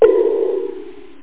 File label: PHASED CRACK